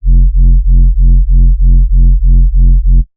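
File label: bass sustain wubwub F; Polyend; ARC Noise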